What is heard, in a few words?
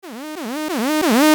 retro; maoudamashii